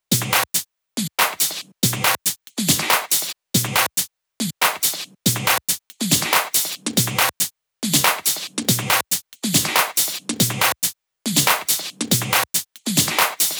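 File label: drum Loop 140BPM hi-hat Loop; Vengeance - Essential Clubsounds Vol.5; VEC5 Drumloops; VEC5 Multi Loops 140BPM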